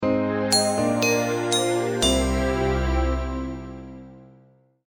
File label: shutdown, phonetones; Plus GSM Poland